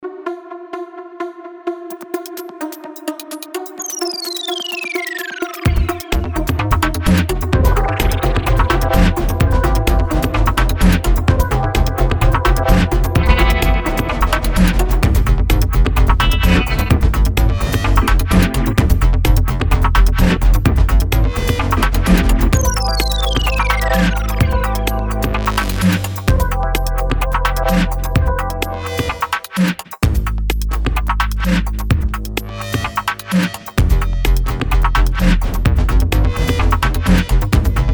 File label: Jingle; On Tv